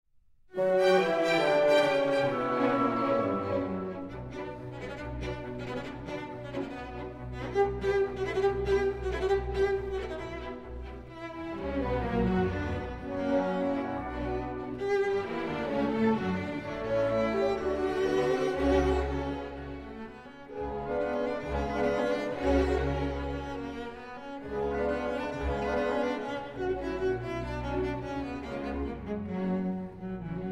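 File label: cello and orchestra; Allegro appassionato in B Minor, Op. 43, R. 119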